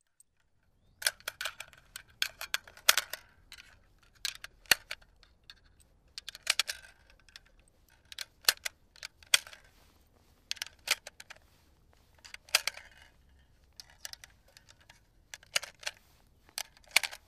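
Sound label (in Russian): zaryadka i razryadka magazina avtomata, loading and unloading a rifle magazine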